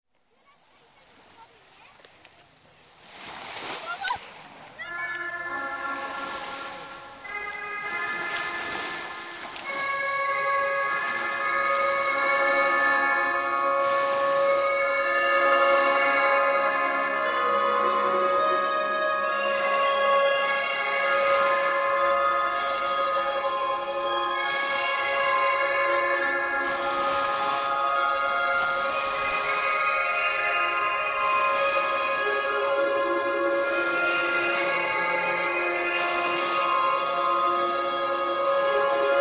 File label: Small waves faint people; beach